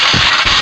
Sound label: charge, battery; hl2